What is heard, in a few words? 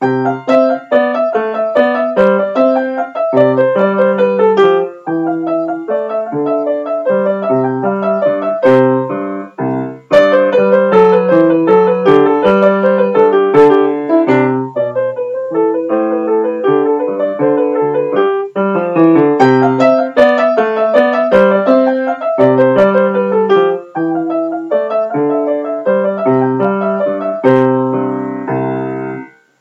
menuet en rondeau, piano